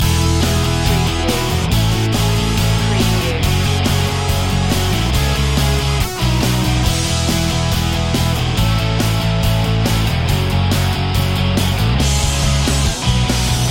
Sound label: garage jam loop